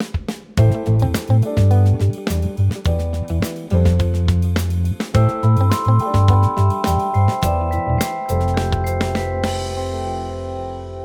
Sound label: Soundlogo; Upcast